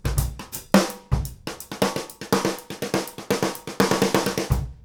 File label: FILL, Grooves Filz Hitz